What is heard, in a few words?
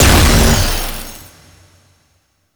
Portal Shatter Deactivate; Ambience; Props; Warframe; Duviri